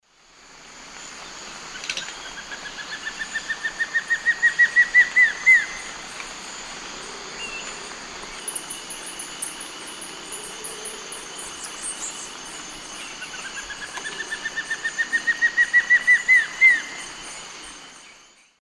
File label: Rhytipterna simplex, song; S. America; Eastern Lowlands